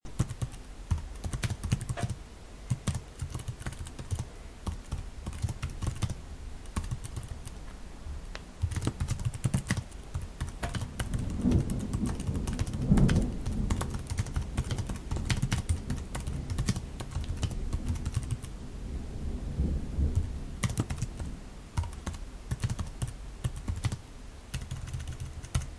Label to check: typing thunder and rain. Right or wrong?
Right